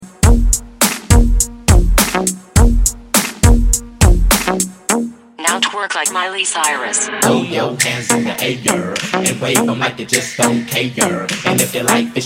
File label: Hype Drop